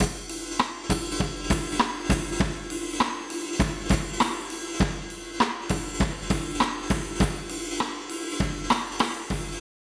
Overheads Multi

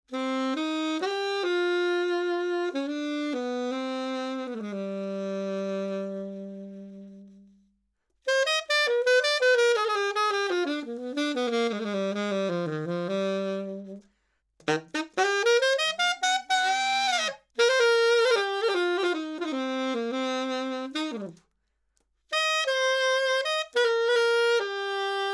Sax Alto 20cm High

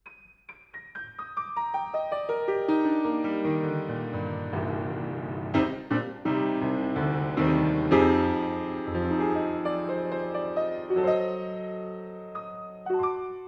Piano, Spaced Cards 100cm

